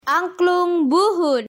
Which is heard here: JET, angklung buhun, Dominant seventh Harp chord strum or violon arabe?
angklung buhun